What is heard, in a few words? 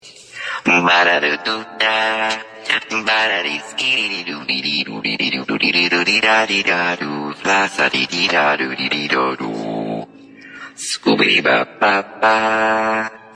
cucaracha con autotune; Voicemod